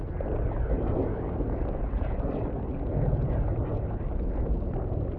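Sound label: atronach storm conscious loop; npc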